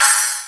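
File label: metal crash; MPC-60 DRUM2; Greytsounds VOL-1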